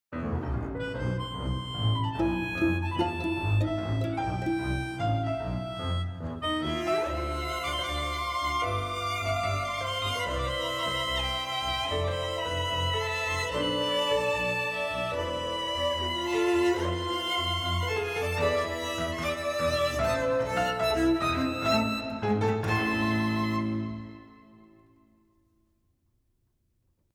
Sophisticated Tango

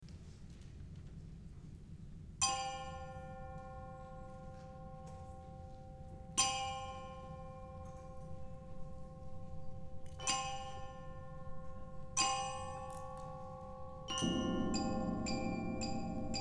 Low Rumble during soft Percussion Intro

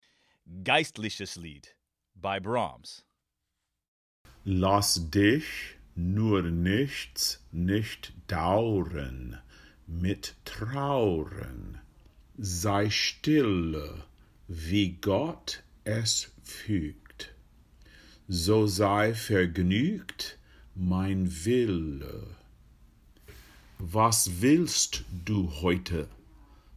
Geistliches Lied German words

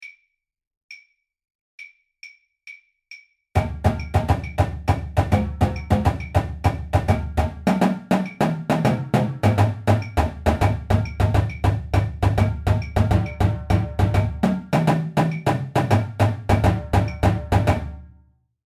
Afro Cuban

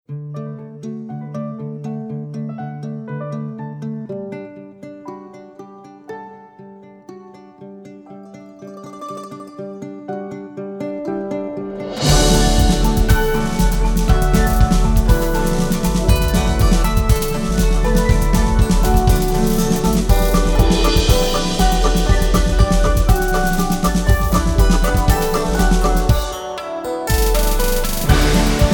Guzheng (electro bluegrass); Instrument Spotlight

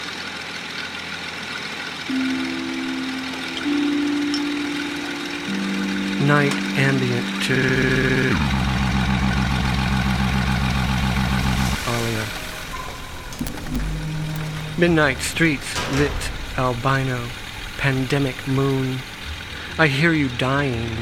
night ambient

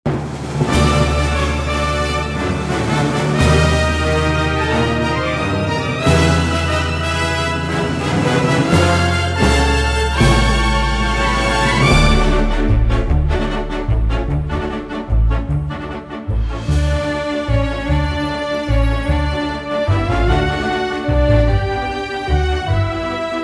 50s Hollywood upbeat plucky

